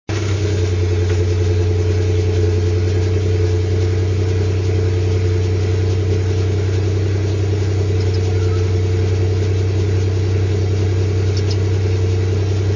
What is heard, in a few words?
cold start, ypipe